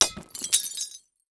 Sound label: Crash Glass Break Beer Bottle; crashes; AdobeCC